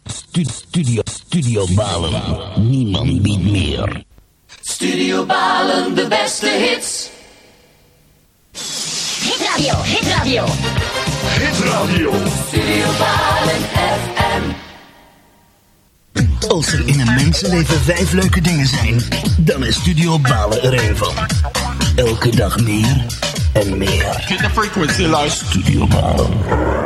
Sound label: jingles; Studio Balen